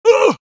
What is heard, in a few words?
Male Fight